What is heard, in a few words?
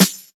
snare dance; Sonic Foundry (Sony Creative Software); Ugly Remnants VOL-2; Beatstuff